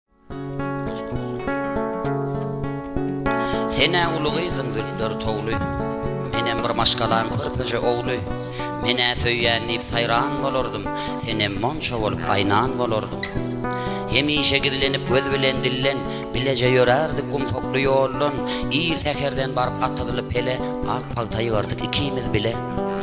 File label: turkmen gitara guitar song